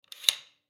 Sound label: Foosball Table; Foosball Table Change Score Single Spin; Games